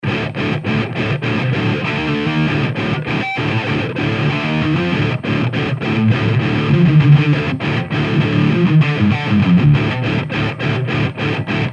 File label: Full Dist; JFET